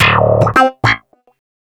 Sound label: Wah Snap in D, Snap Bass; Basses